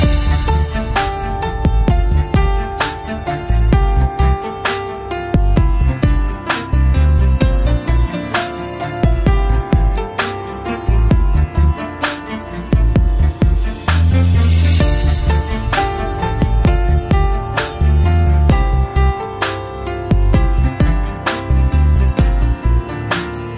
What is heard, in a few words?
piano instrumental ringtone